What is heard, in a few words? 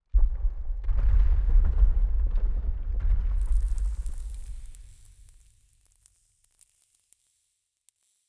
rock fall; Rust